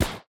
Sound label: shoot Duo; Mindustry